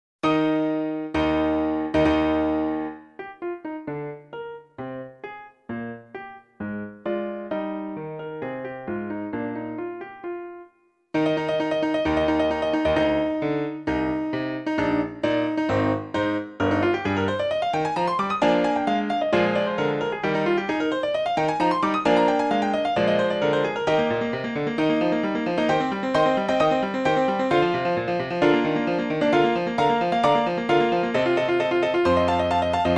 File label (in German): trompetenkonzert; hummel